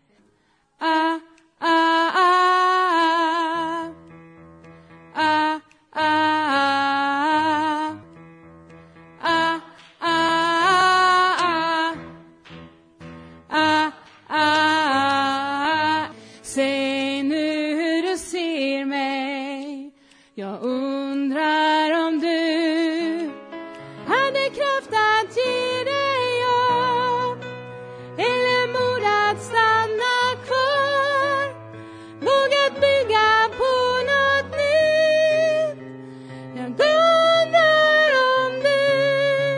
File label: tenor